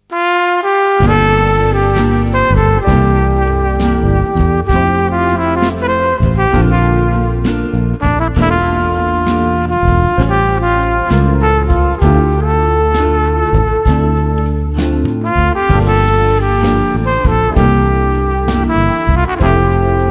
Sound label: Jazz ballads